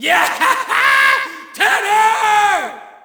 Shout, audience; UFC Tapout 2

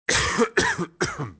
cough; Soldier Of Fortune II Double Helix; XBOX

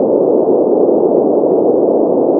Tarmac Concrete Surface; Totaled; XBOX

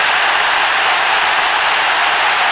Medium Crowd Cheer; David Beckham Soccer; XBOX

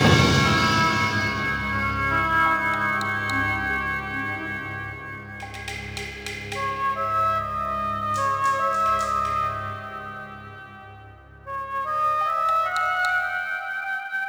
music mole; The Legend Of Spyro A New Beginning; XBOX

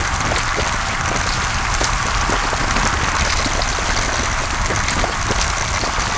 roll mud, terrains; Tony Hawk's Project 8; XBOX

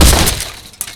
bike

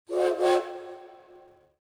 train toot; prague; Soldier Of Fortune II Double Helix; XBOX; ambience

